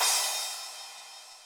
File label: crash, Zoom MRT-3B